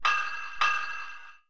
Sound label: PERCUSSN, Ambient, Drum